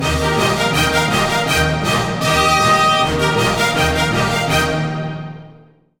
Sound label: Costume Orchestra; Gremlins Gizmo; wii